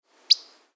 Least Flycatcher Whit

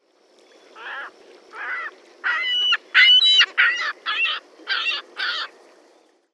Ring-billed Gull long call; RBGU; Prewitt CO

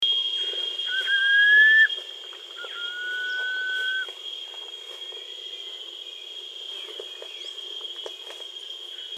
striolated puffbird

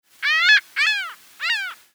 Laughing Gull Long Call

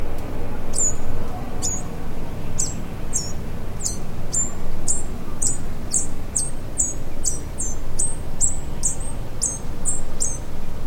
vervain hummingbird, Dominican Republic; puntacana; paul hurtado

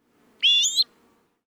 Brewers Blackbird Songlike Call

